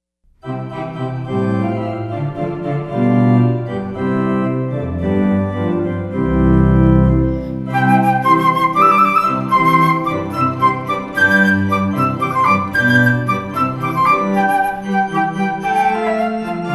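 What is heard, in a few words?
hornpipe, guitar violin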